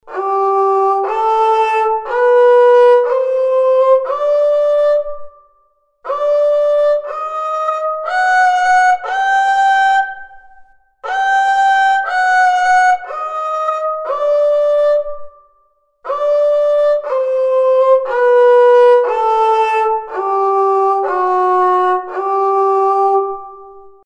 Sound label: kemençe basit suzinak